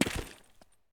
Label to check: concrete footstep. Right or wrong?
Right